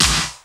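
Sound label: processed snare, drums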